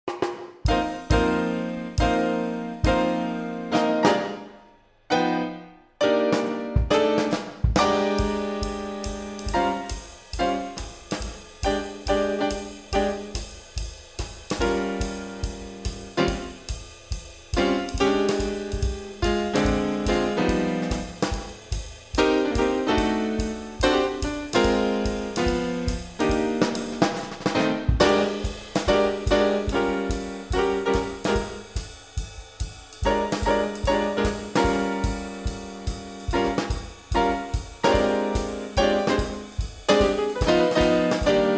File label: Bb Blues Improv (no bass); jazz audition etudes